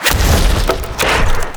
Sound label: Boss Attack